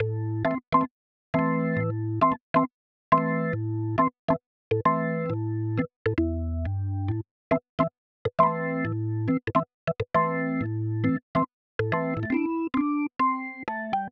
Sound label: reggae organ loop